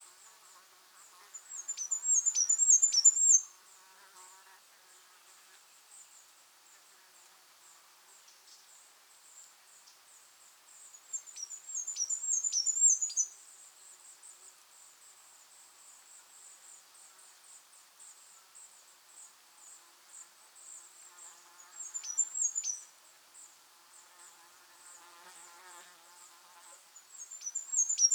Kungsfågel

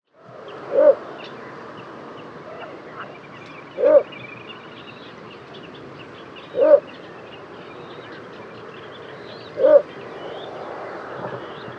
Dvärgrördrom

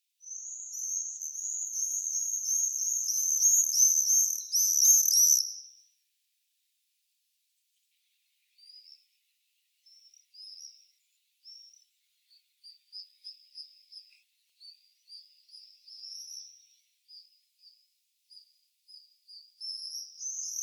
tornseglare

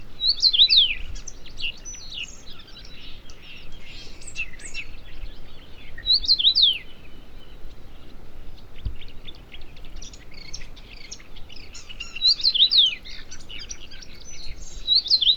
Rosenfink

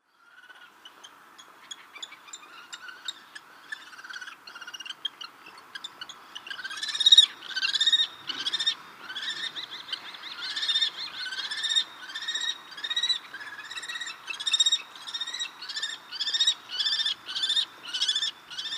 Tornfalk

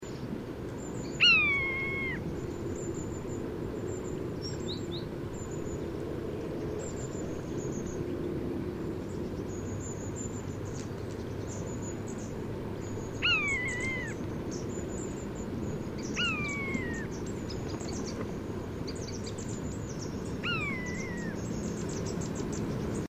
Ormvråk

